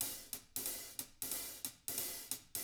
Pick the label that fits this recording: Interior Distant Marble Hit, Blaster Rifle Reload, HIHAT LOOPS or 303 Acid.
HIHAT LOOPS